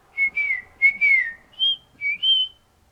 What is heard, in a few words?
my bird whistle phrase